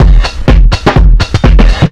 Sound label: drum loop 125, fatdrive, vocal house; PS-28 Vocal House Samples; drumloops